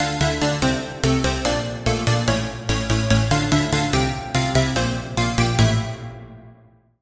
Pluck; Routed Sound; Sylenth1 Expansion - Reality Maker Vol.1

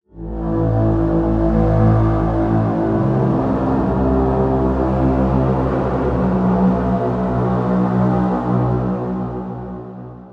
Pad; Routed Sound; Spire Expansion - Noble Gangster Vol.1

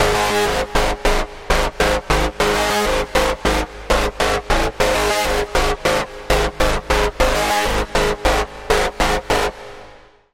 Lead, Sylenth1

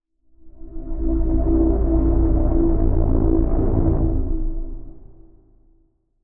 Pad, Sylenth1; Routed Sound; Sylenth1 Expansion - Chaos Bringer Vol.1

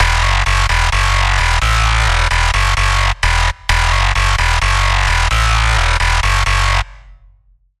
Bass; Routed Sound; Spire Expansion - Chaos Bringer Vol.1

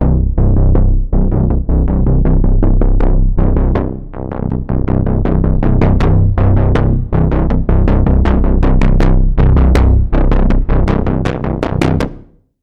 Pulse; Gearing; Routed Sound; Vital Expansion - Quantum Enforcer Vol.1

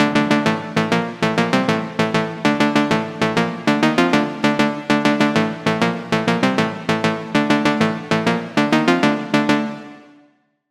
Pluck; Routed Sound; Serum 2 Expansion - Noble Gangster Vol.1

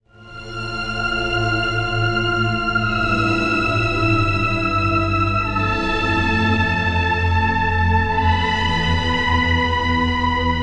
Pad, Serum 2; Pad - Twisted Reality; Routed Sound; Serum 2 Expansion - Reality Maker Vol.1

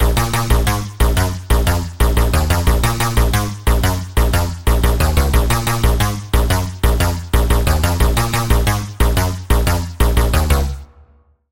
Pluck; Routed Sound; Spire Expansion - Chaos Bringer Vol.1

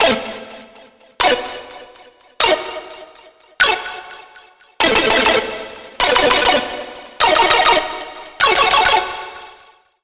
SFX Pixel Gun; Routed Sound; Vital Expansion - Hyper Gamer Vol.1

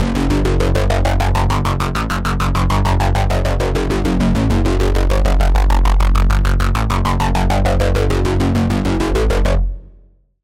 Bass; Red Soul; Routed Sound; Serum 2 Expansion - Doom Carpenter Vol.1

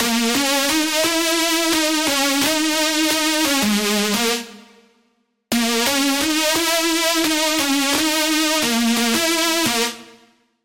Lead; Dynamite Apocalypse; Routed Sound; Vital Expansion - Chaos Bringer Vol.1

